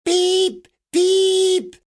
Roll B; MOBICLIC 65

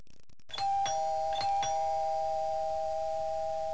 doorbell

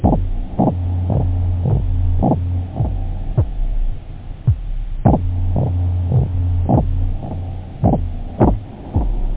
electro; OctaMED SoundStudio V1; techno

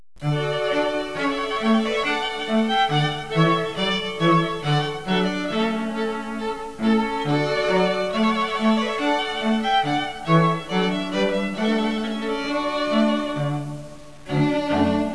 TRUMPET 8BIT

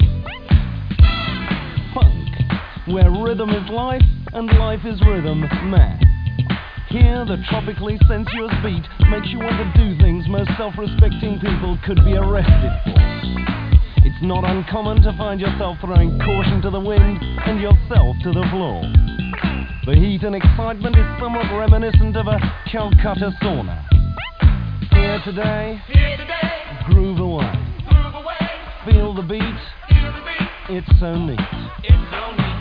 nervous

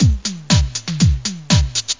breakbeat; World of Sound; instruments; beats

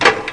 lever release; Maurice's Toaster

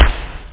attack kick; kicks; OctaMED SoundStudio V1; jazzies samples; perc

